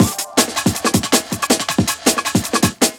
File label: Ten Break; Jungle; Breaks; Blu Mar Ten - Jungle Jungle - 1989 to 1999 Samplepack